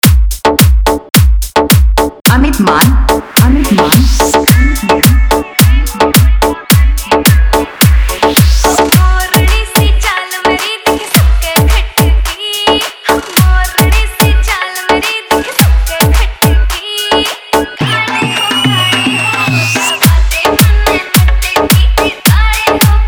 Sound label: Hard Bass Remix Song